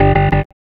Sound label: Tec Org, Keyboards